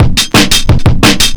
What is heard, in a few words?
175, Breaks; Drum&Bass; EZ Rollers